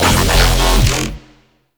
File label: Infested Mercy Shotgun Growl, Weapons